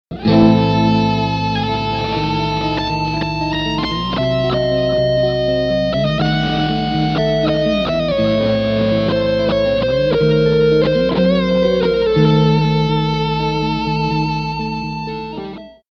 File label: Feedback Generator In Guitar